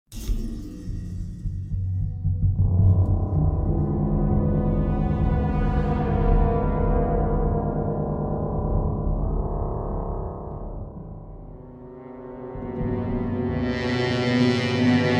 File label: Regent Suspense; Dishonored